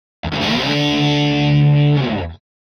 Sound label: Guitar Heavy Metal Finale Chord; Hollywood Edge; sound designer's toolkit v2